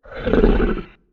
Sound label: Roar